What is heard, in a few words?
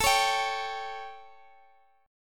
A 7th Guitar Open D, chord; x,x,x,15,16,17